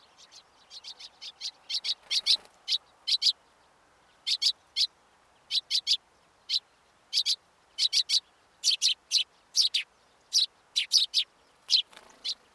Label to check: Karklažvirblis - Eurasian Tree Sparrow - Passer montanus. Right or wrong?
Right